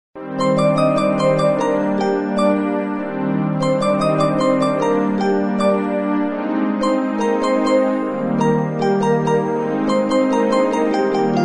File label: movie theme music tone ringtone